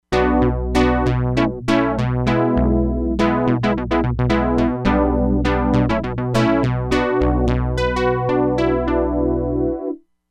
SAWTOOTH DECAY, Crumar Bit 99; Factory Programs

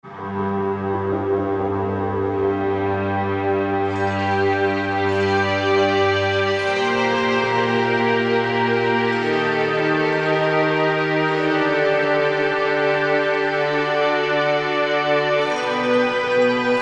SweetSynth; Korg MSC-14S Sound Effects

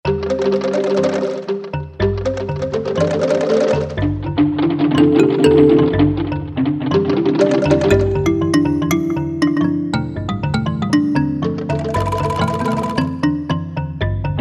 Afro Split; Roland SC-880; Factory Patches

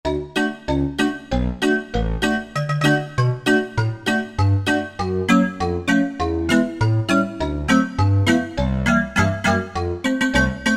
Wave Mallet, Korg Wavestation A-D

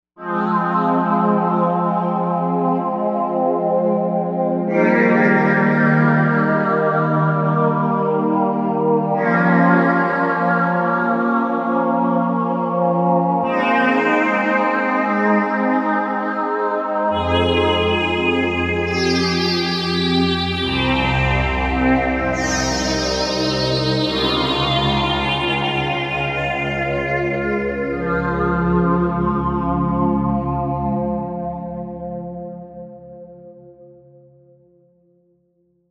Classic Sweeper, Novation UltraNova; Factory Patches

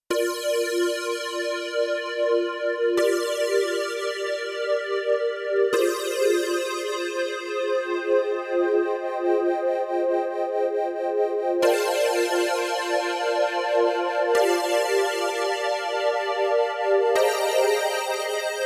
SQ Blubber, Korg Radias-R

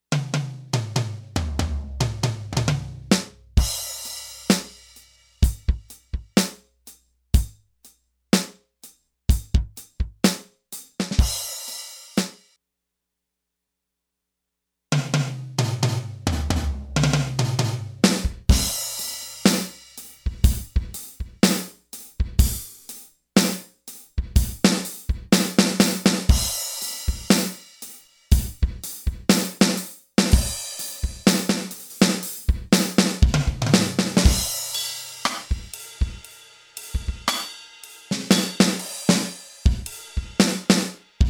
Short Nonlin; Factory Programs

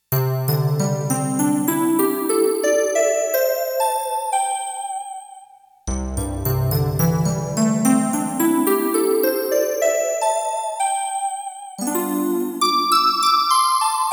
SYN HARP, Roland JX-1; Factory presets

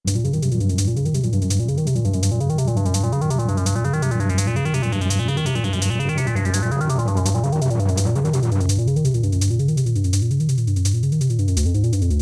Dark Moon, Korg Radias-R

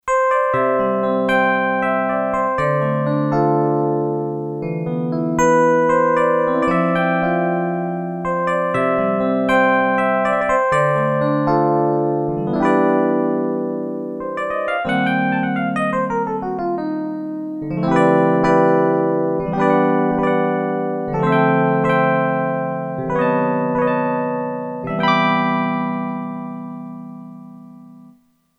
EP, Korg DSS-1

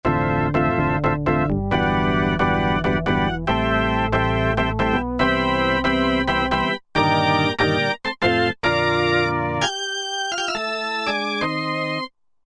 VK-1 Organ; Roland SR-JV80-04

